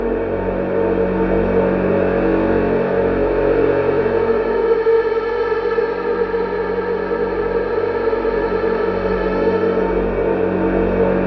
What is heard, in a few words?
WAILING, DRONES; Spectrasonic Distorted Reality 2; DRONES 3